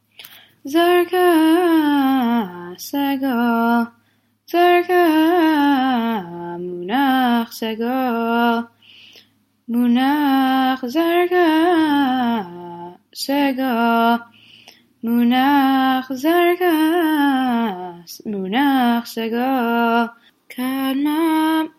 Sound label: polish lithuanian eicha סגול